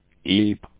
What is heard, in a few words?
eep voice